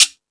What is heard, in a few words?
Percussion, Drum Machines E-MU Pro-Cussion